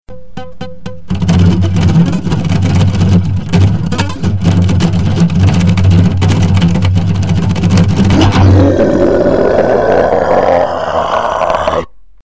grindcore

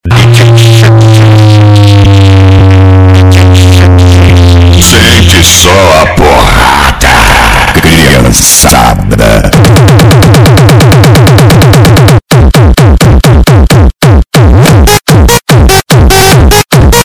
funk bass

